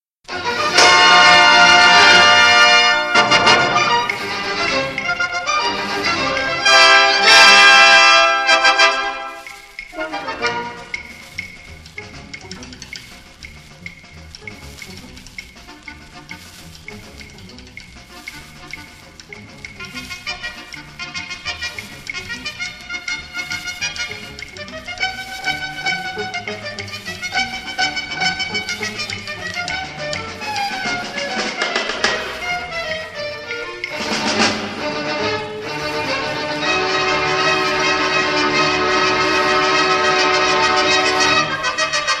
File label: RHUMBA